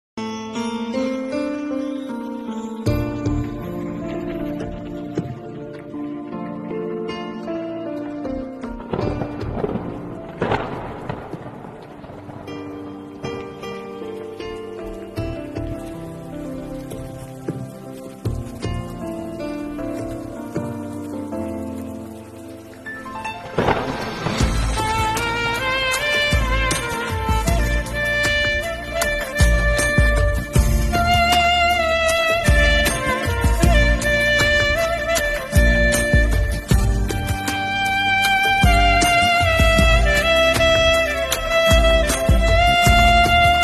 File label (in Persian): Ringtone Bikalam